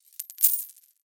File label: cash